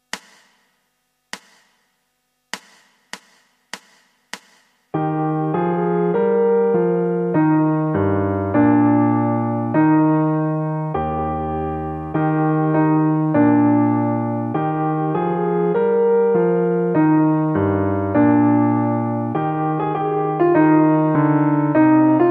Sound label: Trumpet Tune